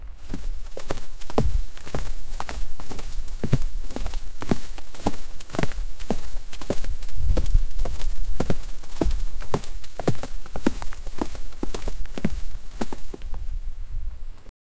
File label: big foot running through